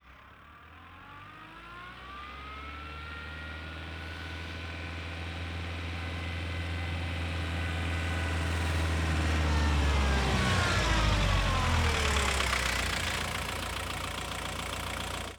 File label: Volkswagen Golf II 1.6 Diesel Engine Exterior Reverse; Mono; SoundHolder